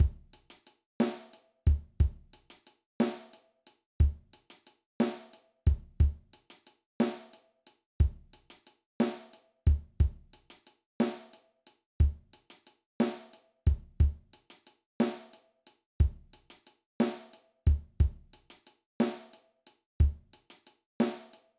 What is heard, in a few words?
Slow Blues with Busier Cymbal Pattern